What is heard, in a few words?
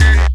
Hip Hit; Jungle; FX; Blu Mar Ten - Jungle Jungle - 1989 to 1999 Samplepack